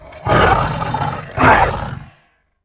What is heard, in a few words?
Leopard Snarl; Action scenes